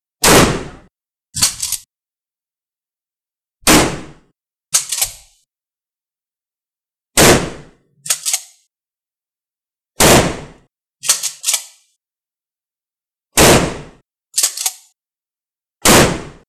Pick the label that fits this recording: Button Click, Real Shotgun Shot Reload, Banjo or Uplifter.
Real Shotgun Shot Reload